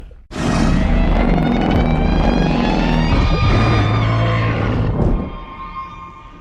sonar blast

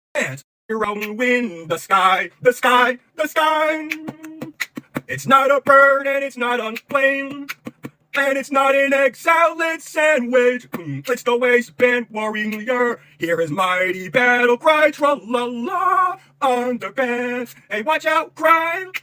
beatbox; captain underpants

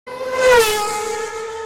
formula 1 sound

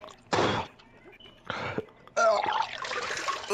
vomit

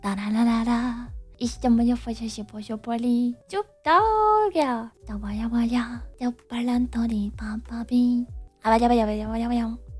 milk noises

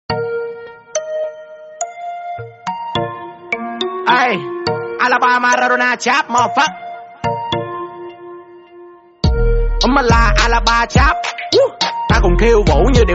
trap

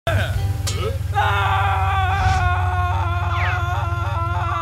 screaming; fuse

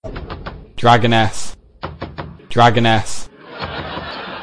knock knock

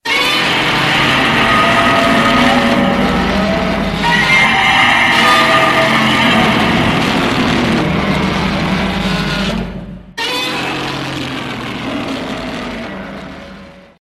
master roar; godzilla

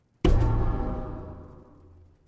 alert sound

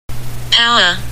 power restored

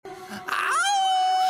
lobo auuuu

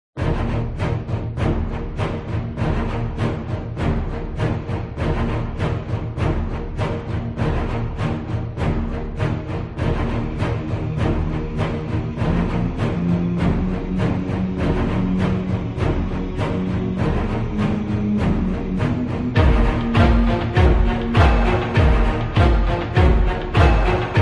war music

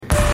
suspense sound

